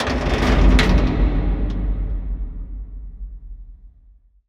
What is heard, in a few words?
stuck creak, crane; Half Life Alyx; chasm